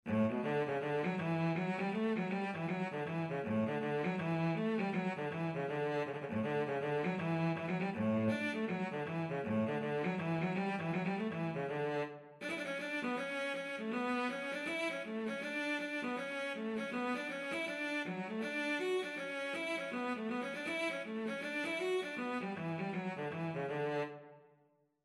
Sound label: cello